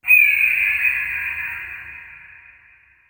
bird

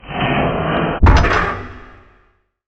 stair

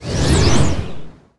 wind

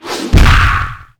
throw

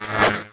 killer bee

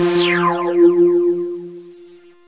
teleport

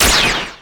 magic dart

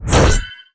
transblade

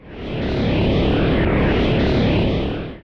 chaos enc

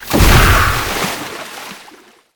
water wave

